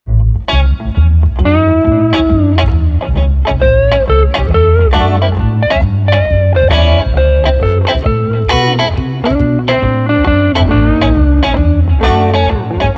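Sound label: Street Guitar Bass Bluesy Jam, 135 bpm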